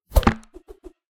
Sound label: spear, weapon attacks; psfx